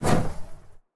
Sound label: Burning Hands Whoosh; Evolve; Meteor Goliath